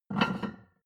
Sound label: plate on plate take soft, restaurant cafe